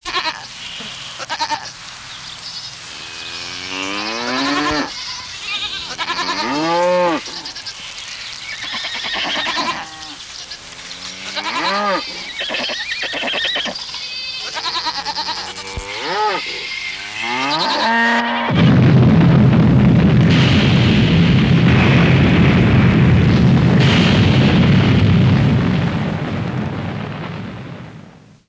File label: Cow Boom